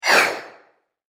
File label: Whoosh Fast And Clear; Whoosh Sound Effects